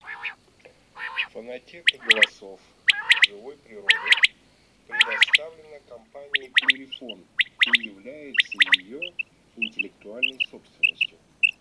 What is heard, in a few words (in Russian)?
Перепел Quaglia; plurifon